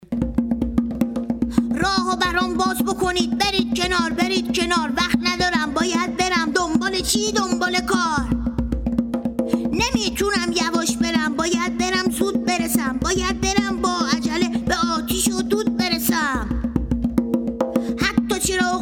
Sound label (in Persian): آتش نشانی